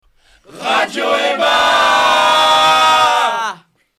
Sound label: jingle